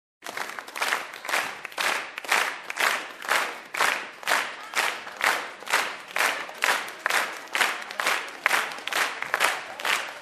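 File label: Applause, clapping in unison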